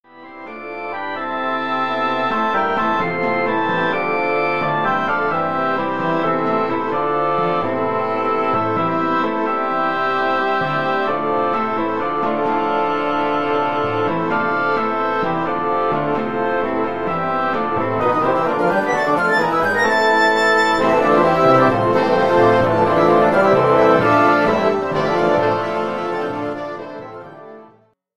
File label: Wind Ensemble